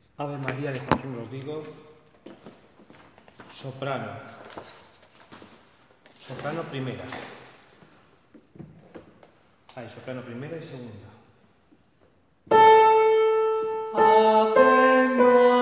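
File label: soprano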